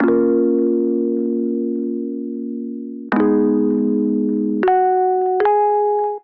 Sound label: Little Amp Electric Piano Bb Minor 77 bpm; MIDI Clips; Tonal; Accompaniment; Ableton; Factory Packs; Chop and Swing